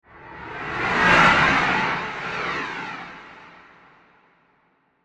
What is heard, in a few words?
Science Fiction UFO Flyby Transition With Panning Delays; SciFi Explosion With Delays; Digital; Impacts; Sound Effects:Impacts; CraftedSignals